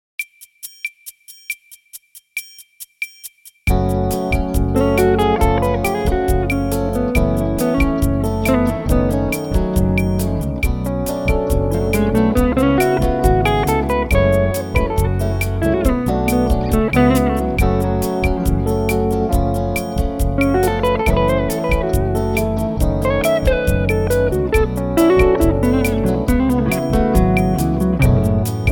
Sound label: single to hum